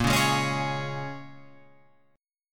Bb Minor 7th, Guitar, Open F, chord; Bbm7 strummed

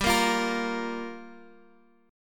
G Minor 7th G down Guitar Standard; strummed; capo 9; chordshape

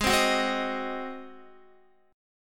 Ab 7th Ab Guitar 4F,4A,5C,5G,5C,6E; Ab7 strummed; JGuitar

